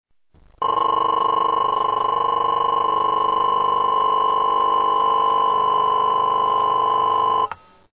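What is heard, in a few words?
old phone ringing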